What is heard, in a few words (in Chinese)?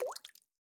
滴水石锥水滴入炼药锅声